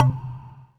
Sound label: cube ball hit